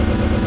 tire

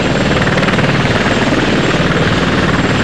jet heli

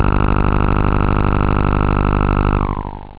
analog, synth

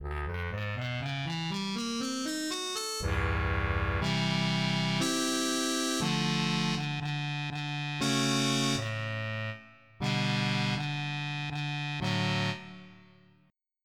Mute Trumpet; EverQuest

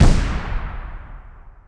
mortar

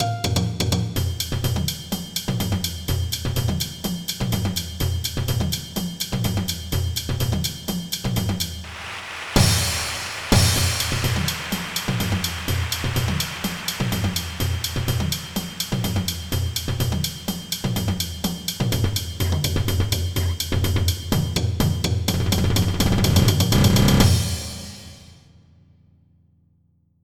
Drum Cadence

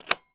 MEDAL

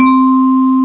Vibes; WordLynx v1.4

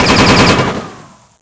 GUN HIT; Falcon 40

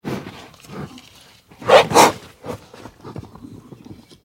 rhino; Nestle Animal Planet Land

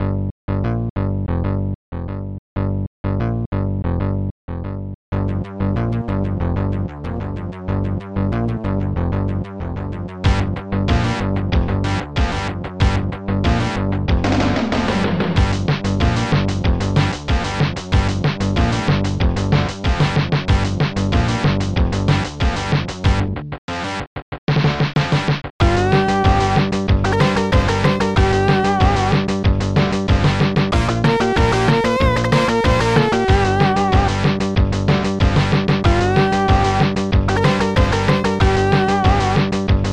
tune

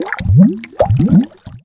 bubbles; outlaws

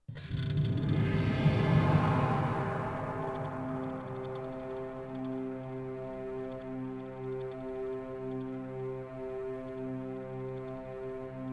cave; DAoC

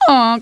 honk; Princess and the Pea; SoftKey

